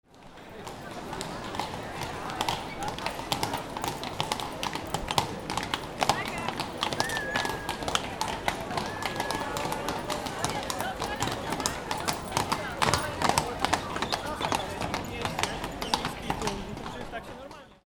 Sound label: Horses walking on the street sound effect